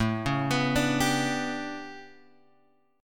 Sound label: A 11th A, guitar chord; A11 Chord {5 4 X 4 3 3} arpeggiated